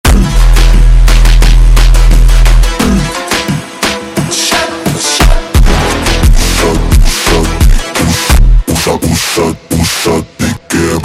ultra Slowed, funk

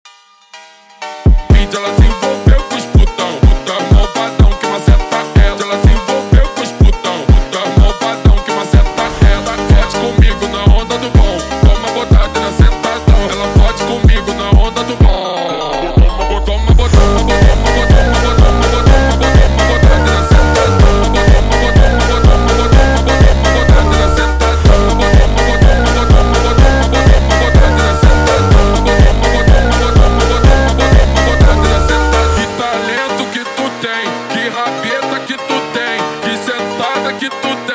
sped Up, funk

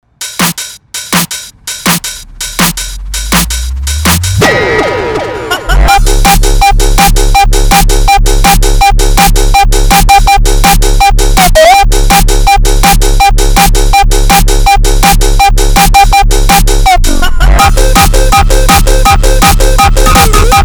Hardtekk instrumental

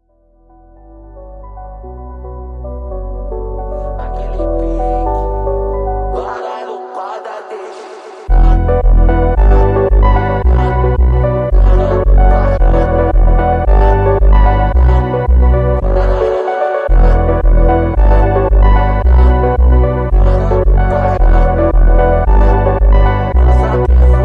sped Up, funk

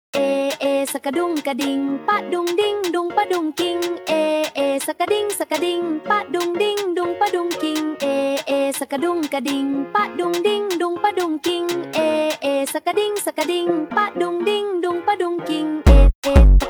Funk super Slowed

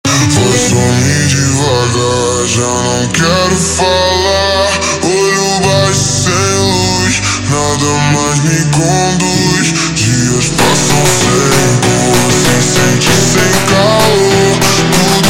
super Slowed, funk

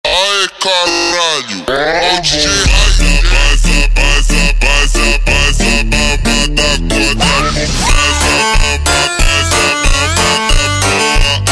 Funk ultra Slowed

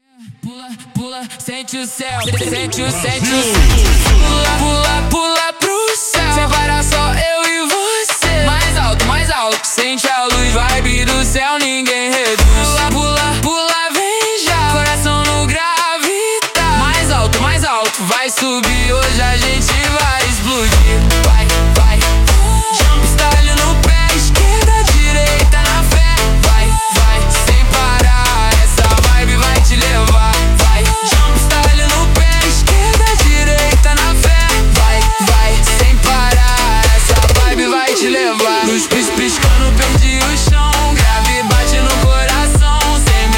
Jumpstyle super Slowed

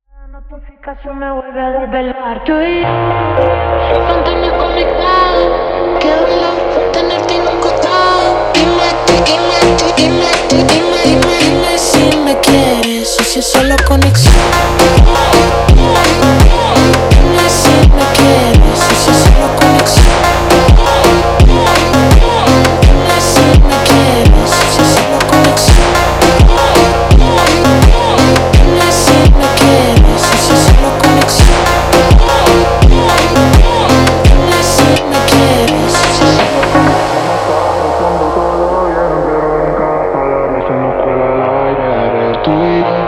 slowed, Phonk

